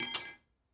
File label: Metal Drop